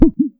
popup

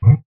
weird, creature

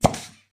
weird, sfx

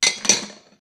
dishes

